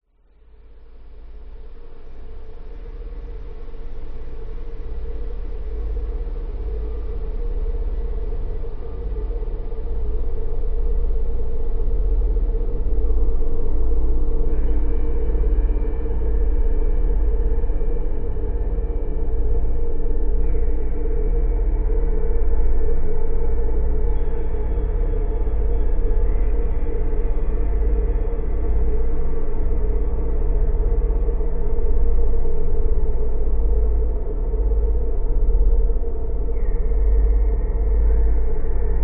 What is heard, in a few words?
drone dark low freq breathy spurts horror; sound design; zapsplat